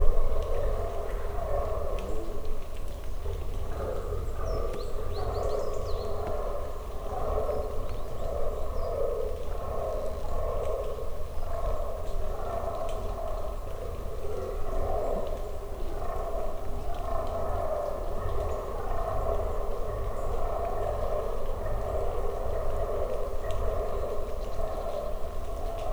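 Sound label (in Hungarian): nagyon távoli bőgés, dámszarvas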